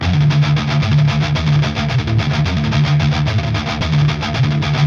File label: Riff; csound